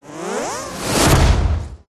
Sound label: whoosh; Audio Mangler